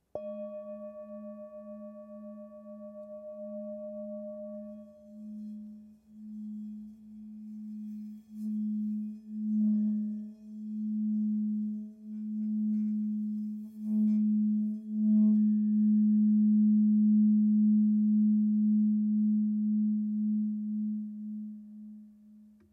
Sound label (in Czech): tibetska misa stredni, Tepaná tibetská mísa Go o hmotnosti 887 g; Tepaná tibetská mísa Go o hmotnosti 887 g. Mísa je včetně paličky s kůží!